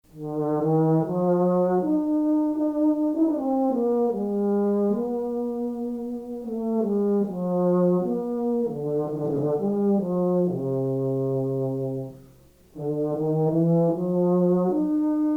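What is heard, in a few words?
euphonium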